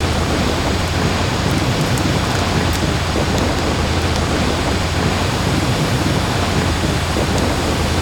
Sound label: Ice Water; ambience